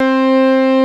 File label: FARFISA STR; Greytsounds